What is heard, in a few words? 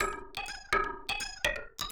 PERC LOOP INDST 125, Industrial Loop